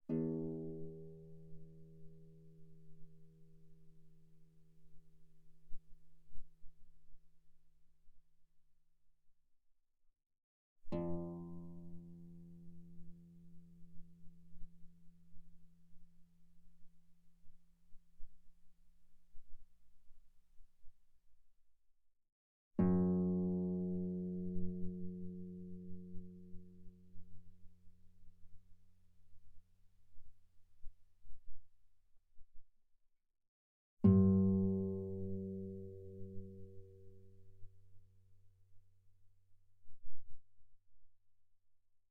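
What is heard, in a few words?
Guitar mezzo forte sul E E2 B2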